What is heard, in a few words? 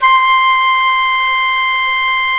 FLT FL AC, C Flutes